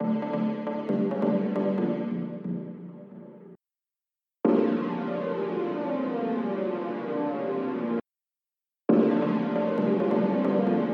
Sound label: Synth